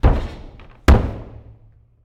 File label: Boxing Ring Bodyfall